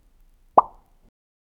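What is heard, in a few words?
a single soap bubble popping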